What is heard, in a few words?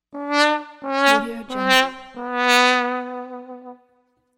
fail